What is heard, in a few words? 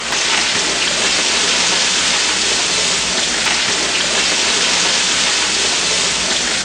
Chicken Frying, Chicken Frying in a pan sound effect. Great sizzle sizz